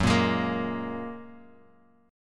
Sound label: Gb Major Add 9th, Guitar Open F; strummed; 6,5,8,5,x,x; JGuitar